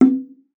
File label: BONGOS, BONGOS-CONGA; Best Service ProSamples vol.42 - Session Instruments